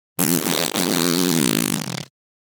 Fart Foley At Last Deep; Hollywood Edge; sound designer's toolkit v2

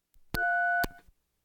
Household Phones

